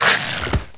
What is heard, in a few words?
mongoos dead, monster